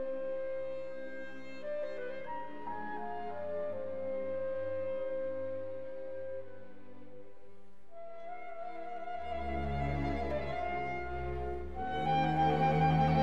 Concertino in E Flat Major Adagio Ma Non Troppo Andante Allegro, New Classical Orchestra